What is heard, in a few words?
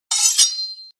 knife draw